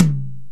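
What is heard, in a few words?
DR550 Tom High, Boss DR-550 Drum Machines; 300 Drum Machines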